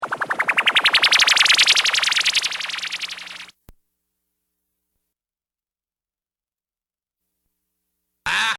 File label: Wooop